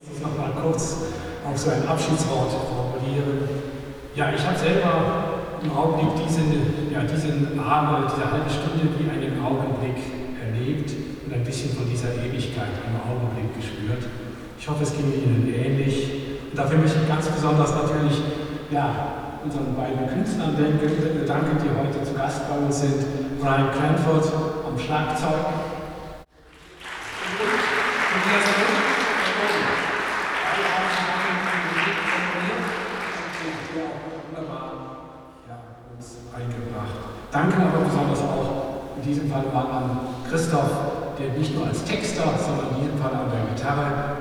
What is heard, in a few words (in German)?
abschied applaus danke